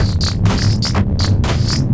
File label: Hoppin Lasers, Ethereal Textures, Beats; Sonic Foundry (Sony Creative Software); Ma Ja Le Ethereal Textures